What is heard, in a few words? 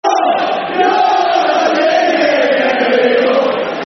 You're Not Singing Anymore [Football Chant (Soccer Songs)], Oldham; Oldham Athletic Oafc